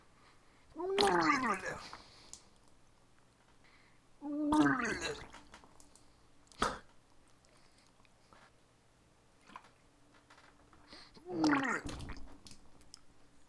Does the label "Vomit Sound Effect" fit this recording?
Yes